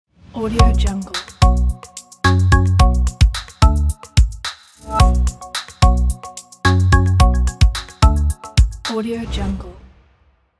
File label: fashion podcast logo; sawtooz